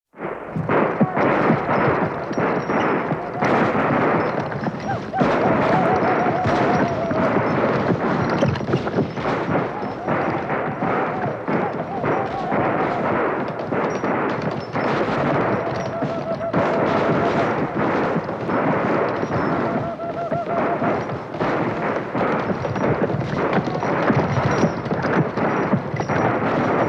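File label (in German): Kampfszenen Indianerüberfall Gewehrschüsse Pferde Waggons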